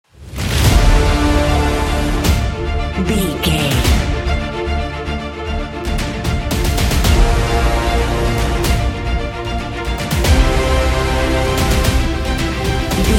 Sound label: epic trailer intro ident